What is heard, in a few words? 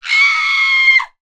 horror female scream; sound effects one